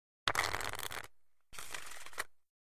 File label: Cards Shuffle Deck; casino games